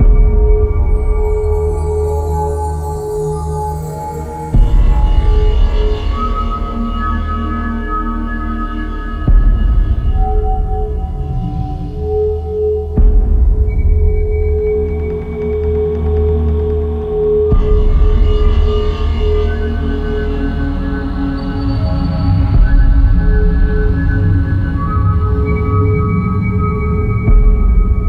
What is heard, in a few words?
Cavern Music Loop; moul